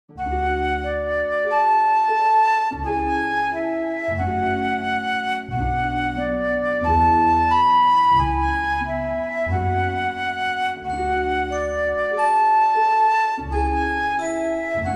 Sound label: snow falling